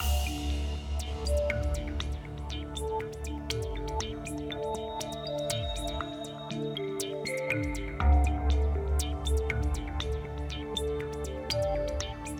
Background Loop; buzz brain of the uk 2009 ps2

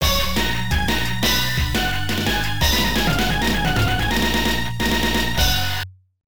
Match Won; Super Sidekicks; Neo Geo arcade

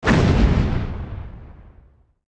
warp flash; SFX tng warp flash

